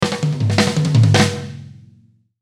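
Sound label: Musical Element, Drum Snare March; Blastwave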